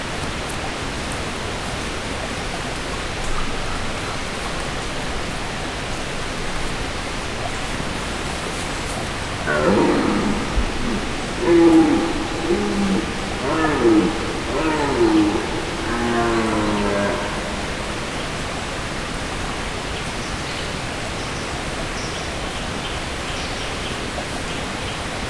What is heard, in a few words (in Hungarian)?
lápajon bőgő bika, gímszarvas